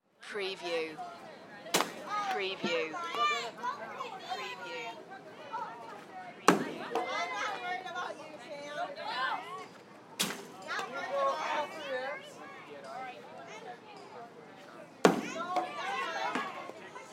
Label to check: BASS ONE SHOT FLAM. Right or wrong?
Wrong